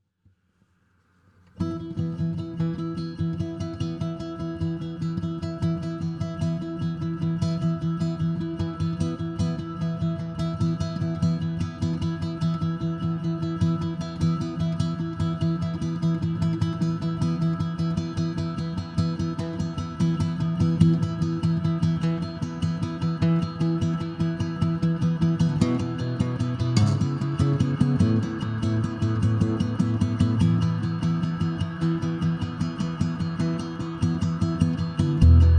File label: Sound Waves Pulsing